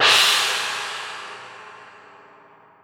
Orch perc; musicradar; cartoon caper

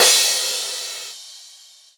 Crash, Cymbals

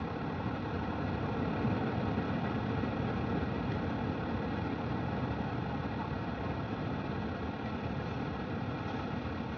Ambience Loop, outdoor

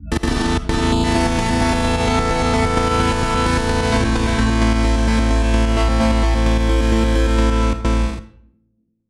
pad, ish F; M8

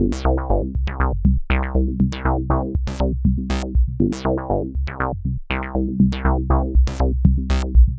Bassline, Prototechno; Sonic Foundry (Sony Creative Software); Basses

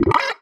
Sci-Fi Lasers - Droid Talk; Antidote Advent

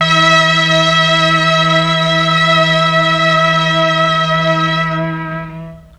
MEL STR, Legendary M400

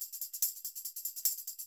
TAMB, Percussionworks; Sampleheads; NYC Percussionworks

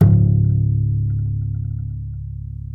MARTIN AC SUS C#1, Bass; Sampleheads; Will Lee Bass Library

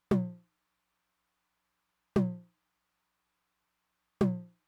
high tom, Roland TR-909; drum machine; Roland TR-909 Ableton Project

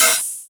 Open HH, Cymbals

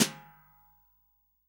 SWING SNARE CHROMATIC, Real Drum Kit; REAL DRUMS

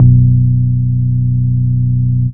BASS SYNT; Big Beat Samples & More

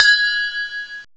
FINGCYM; PERCUSN; M8; CMI Factory

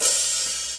HH, Dance Mega Drums; Best Service; HH-HIP

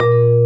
VIBE

